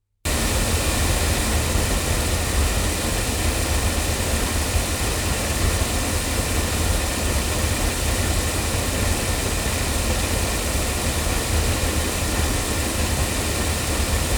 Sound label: Oktava MK220 cardioid loudspeakers